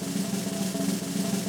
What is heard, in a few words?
HiHat Countdown